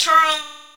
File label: dancer Turn; HeavenStudio; space Dance